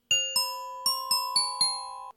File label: Floating warbler